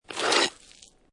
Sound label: Snow And Ice Ice Skate Slide With Ice Particles; Weather